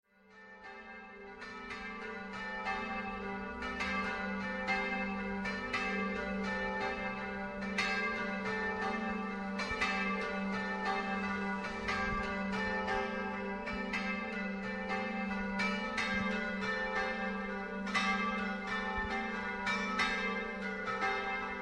San MARCO, campane

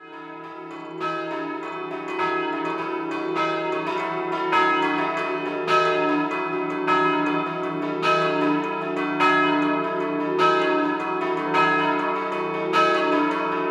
Rive D Arcano Scampanottata

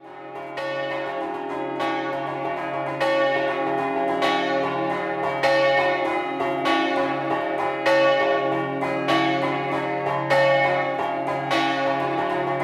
Villaorba Scampanottata, campane

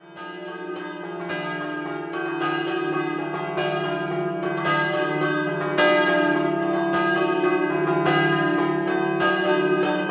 Coseano, campane

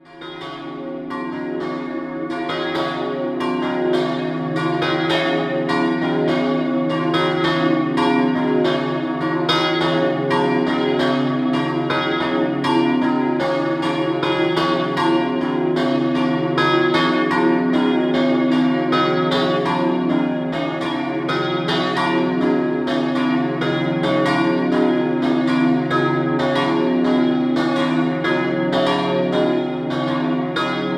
Pinzano al Tagliamento, campane

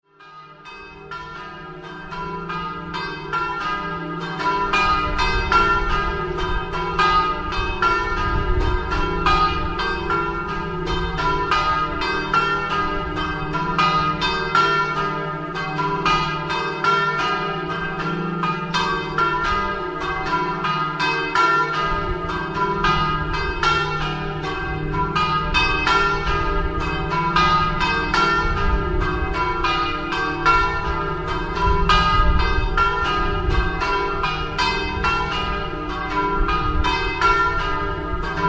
IALMICCO, campane

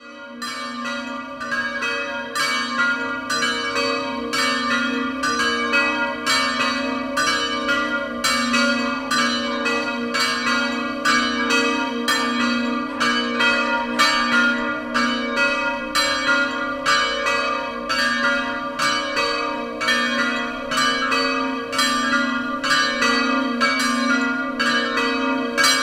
Campagna, campane

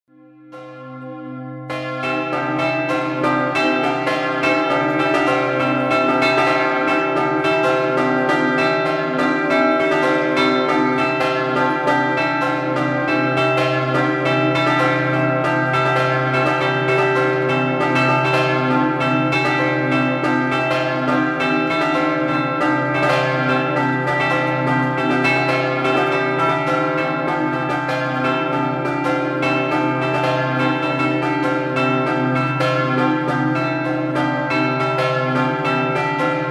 scampanio; ovaro; campane

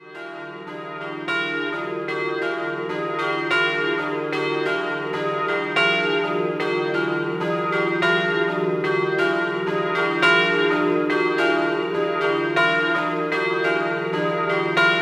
Perteole, campane

